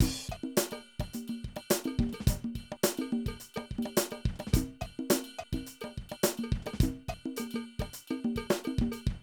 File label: Drum loops, 70s funk